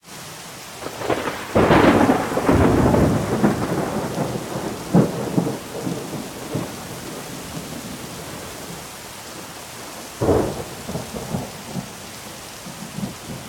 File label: autumn rainstorm, ringtones; Google; Google Sounds; Seasonal Celebrations